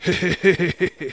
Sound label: laugh; 1997 MMX LAN PACK (win 95); Worms Armageddon; Jock